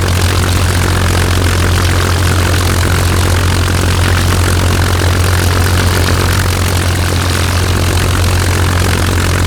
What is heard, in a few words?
Aircrafts Engines DB605; DCS